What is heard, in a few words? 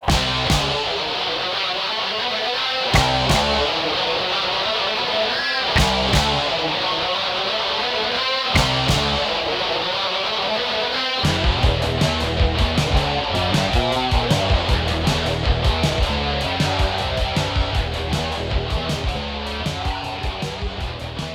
retro rock guitar podcast bed